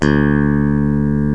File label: FACTOR PIC D2, BASS; Greytsounds